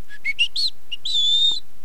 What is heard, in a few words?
succes mouthed; Gravity